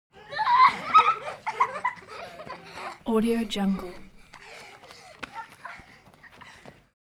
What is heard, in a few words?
human vocal kids playing laughing